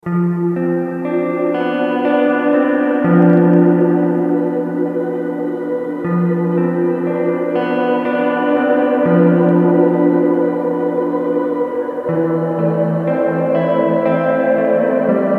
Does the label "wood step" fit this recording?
No